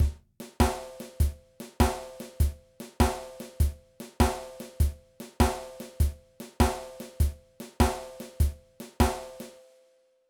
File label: Shuffle Groove, drum